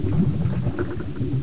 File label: bubble